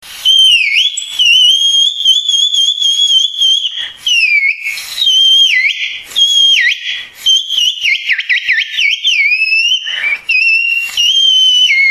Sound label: mouth